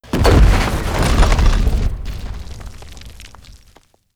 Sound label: base destruction; damage; engines